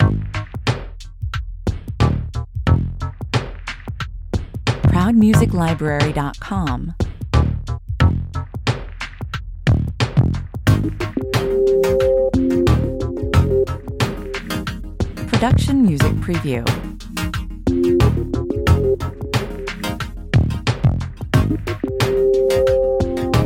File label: space lounge 90 bpm, Extremely delayed easy groove, well lit atmosphere